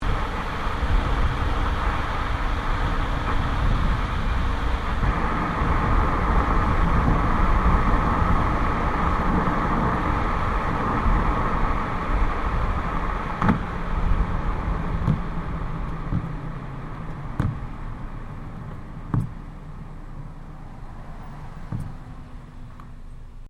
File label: Car tyre noise decel catseyes; Cars (Sound Effects:Vehicles)